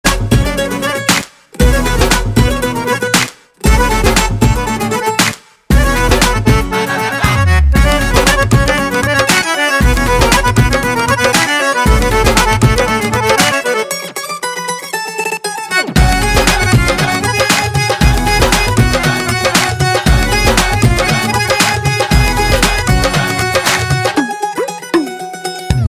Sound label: Music Ringtone